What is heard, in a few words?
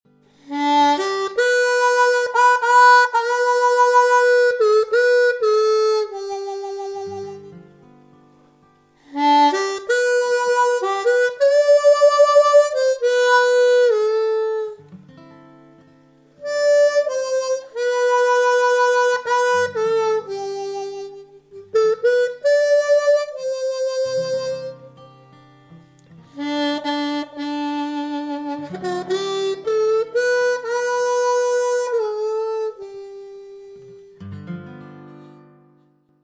Red River Valley - Solo, harmonica